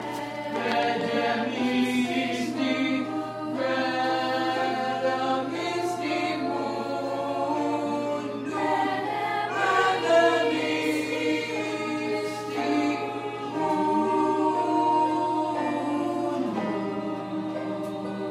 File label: hallway choir